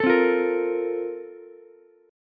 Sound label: Bb Major 7th Suspended 2nd, Ukulele; BbM7sus2 strummed; JGuitar